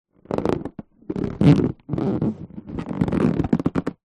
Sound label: balloon rubs squeaks hands